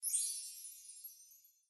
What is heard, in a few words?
REVEAL SHUFFLE; Monkey Spin